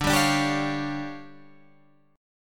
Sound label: D Minor Major 7th Double Flat 5th, D, Guitar Open G